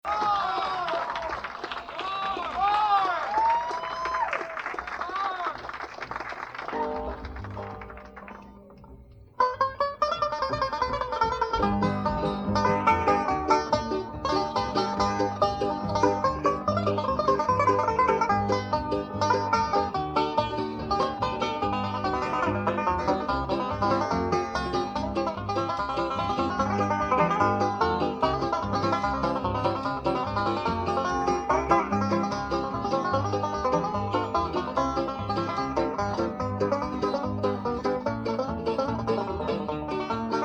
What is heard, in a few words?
Banjo Breakdown; Fincastle65